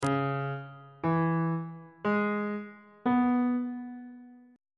c sharp phrygian 7th chord 1 is c sharp minor 7th on bass clef